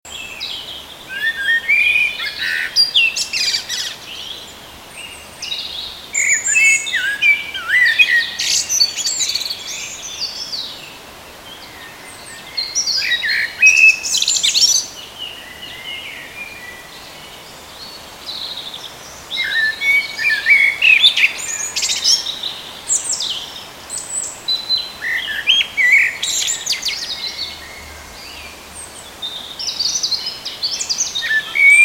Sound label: turdus merula, vogel; hecke; biologie